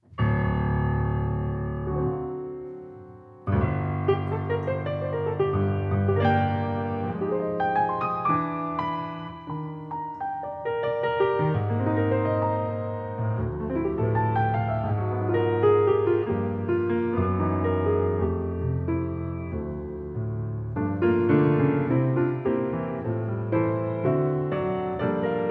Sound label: Short Impro